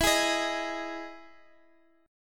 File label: E Major 7th E down